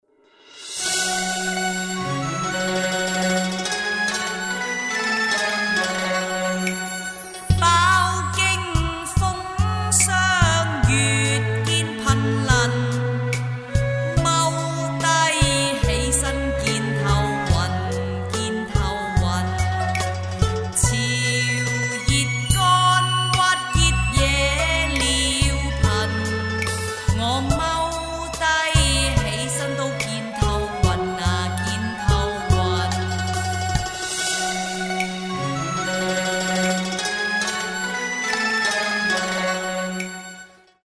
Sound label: mum song